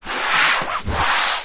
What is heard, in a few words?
household book thick hard back cookery slide table; zapsplat